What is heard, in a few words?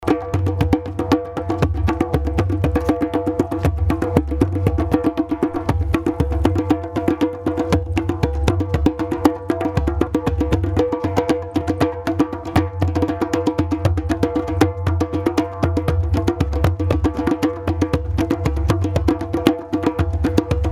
Drums